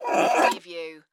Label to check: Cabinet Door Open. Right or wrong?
Right